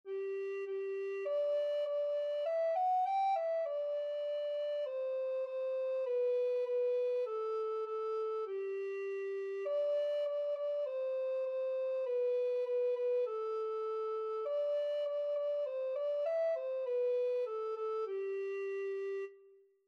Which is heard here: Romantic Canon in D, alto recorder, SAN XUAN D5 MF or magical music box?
alto recorder